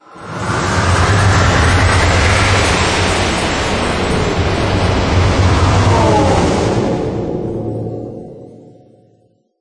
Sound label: Death; Magic the Gathering Shandalar